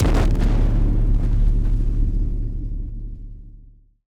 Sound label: SubAtomic Bomb; Nova Sound; Explosive SuperNova